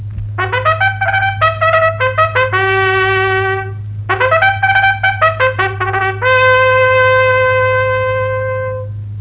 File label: fanfare